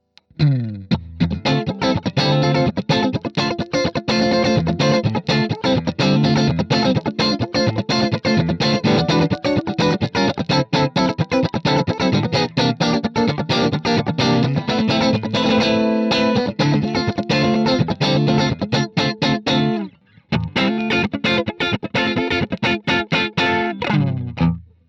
Strat Clean Endstufensättigung

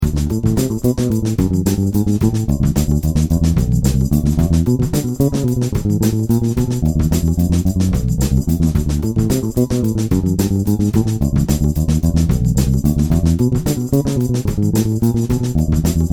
viking stellung 3; hagestrom

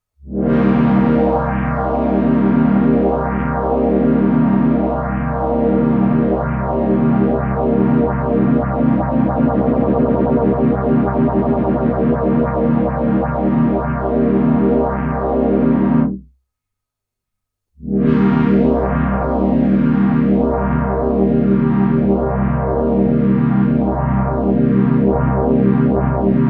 Chorus und Phaser

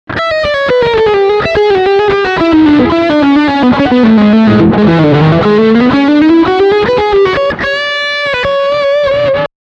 Leadline Distortion hintere

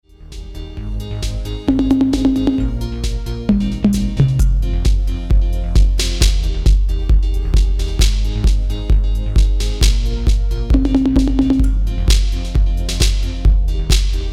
Alesis Turbo Mesh Kit Play alongs

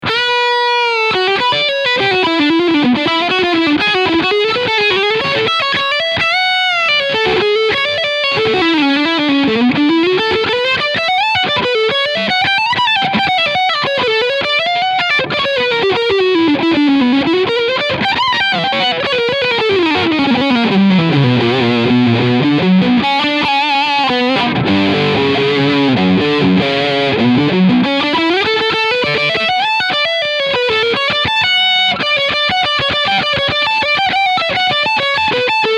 Jackson Pro Soloist SL2Q E Gitarre Overdrive Sound Steg Pickup

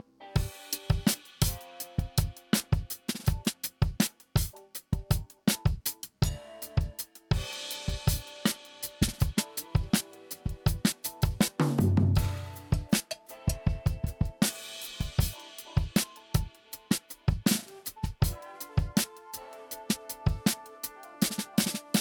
Oversampled Drums Leichte Bearbeitung

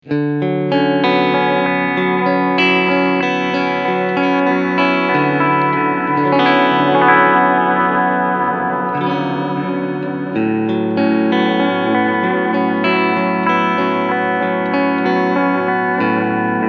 med delay 4tel BRIGHT

